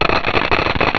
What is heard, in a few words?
tick